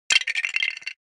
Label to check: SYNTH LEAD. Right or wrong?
Wrong